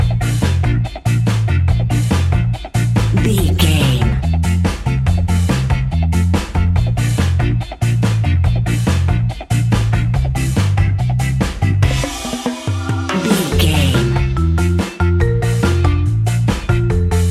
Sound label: carribean ska, A hot and spicy carribean island ska BBQ! Classic reggae music with that skank bounce reggae feeling.